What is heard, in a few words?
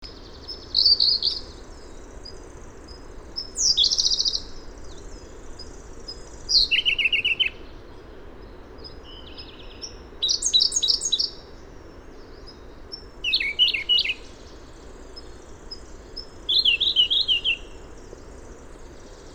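siniy solovey golos, Siberian blue robin voice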